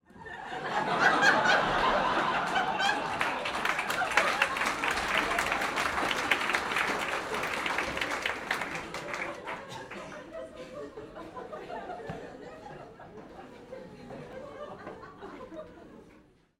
human audience laughter and light applause comedy club x200 people; komedia brighton comic boom